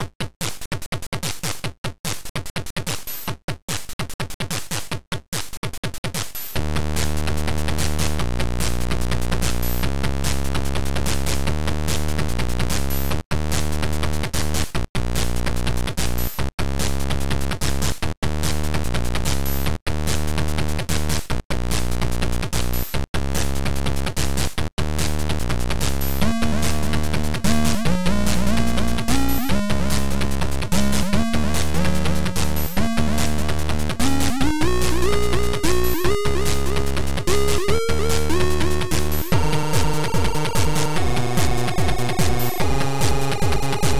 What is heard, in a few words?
Power Noise Happy Birthday